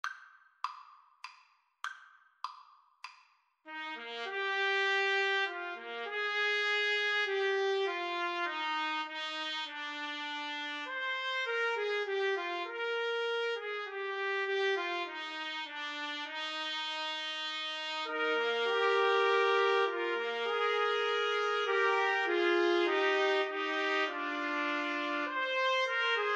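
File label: trumpet trio, karaoke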